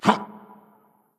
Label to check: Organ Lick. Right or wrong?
Wrong